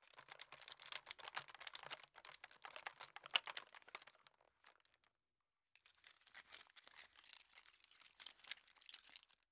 Soldier Metal Gear Movement, Rattles; Fairlight Sound Lib

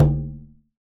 Conga; Cymatics; Emperor World Percussion (Teaser)

